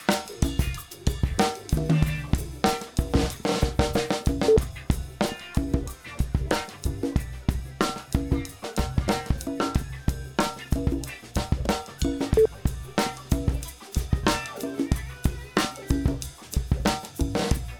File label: Titan VCA COLOR PWM VINTAGE PWM; Dave Hill Designs